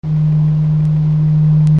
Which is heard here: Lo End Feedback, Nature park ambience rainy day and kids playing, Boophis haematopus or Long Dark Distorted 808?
Lo End Feedback